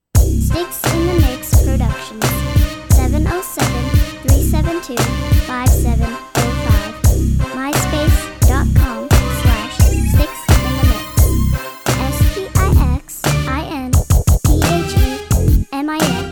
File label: style beat